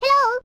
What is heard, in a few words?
Pixie